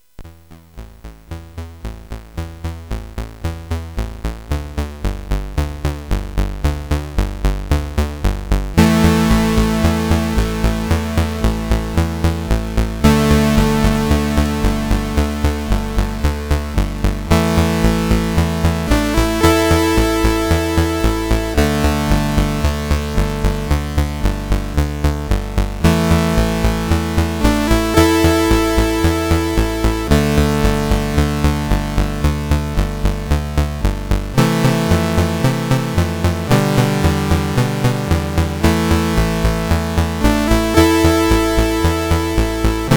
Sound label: Sequencer sid CSG8580R5